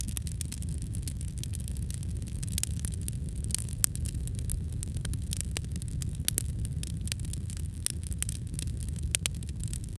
campfire

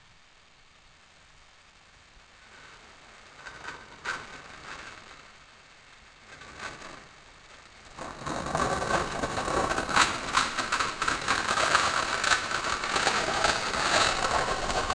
dragging boulder

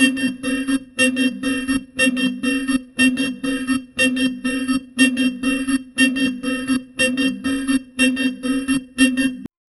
looping detuned bell; motif

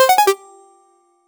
merge 3 mobile game short

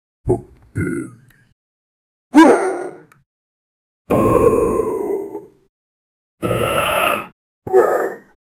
enemy sound for fps game, humanoid

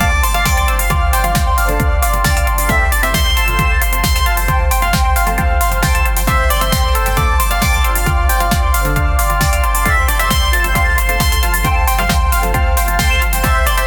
happy 80s party disco

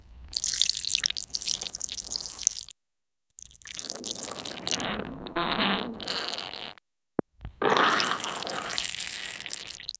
a series of wet tearing, gore

